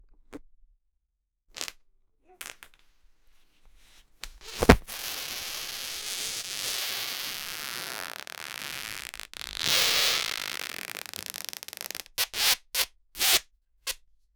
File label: person body begin to infl; human body